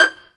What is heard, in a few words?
pizzicato G5